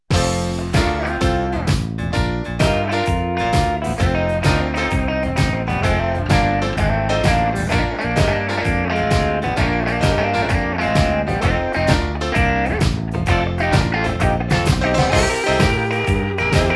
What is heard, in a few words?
BLUES; Cascom